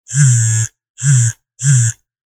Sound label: not answering the phone vibration